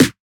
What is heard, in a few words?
Snare, Dance Drum Kit; Drum Oneshots; Twilight; Monosounds